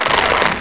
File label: LOSE ZING; BANZAI